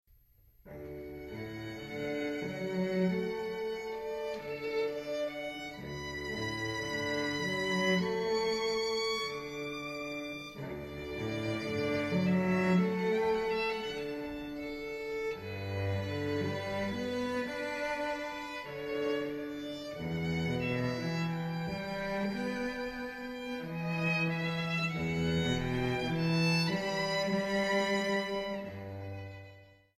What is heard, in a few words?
Meditation violin cello; thais